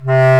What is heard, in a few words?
WND CB.CLA; Roland - LA Composer vol. 1